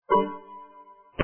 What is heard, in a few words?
chord